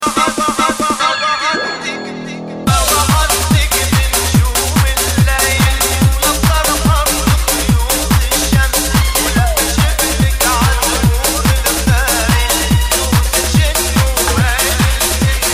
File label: lebanese arabic mix ringtone